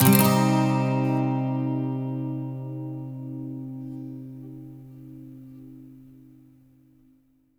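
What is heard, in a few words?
12 string minor C, Electric & Acoustic Guitar Loops; USB Soundscan vol.04; AKAI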